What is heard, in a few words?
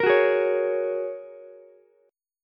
Ab 7th Suspended 2nd Suspended 4th, Ukulele; Ab7sus2sus4 strummed; 15,18,16,16